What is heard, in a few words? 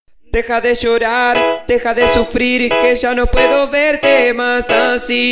cry